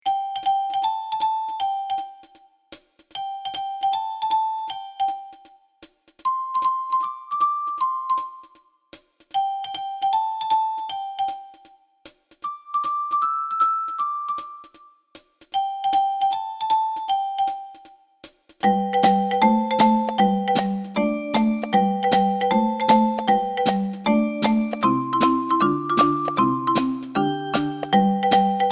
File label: boogie solo samples